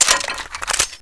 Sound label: mg3 clip in